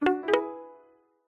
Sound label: multimedia game tone short fast positive plucked; zapsplat